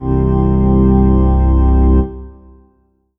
Yamaha CS2x Pipe Organ C3

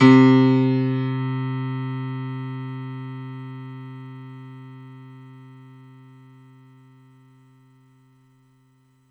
Kurzweil K2000 Stereo Grand C3

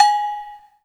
Yamaha TG100 Agogo

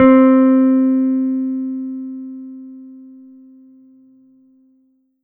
Korg TR Rack Jazz Guitar C4

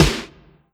Roland D-20 Snare

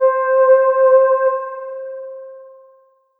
Yamaha V50 Flute Voice C5

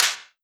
Roland PN-D10-03 Typewriting

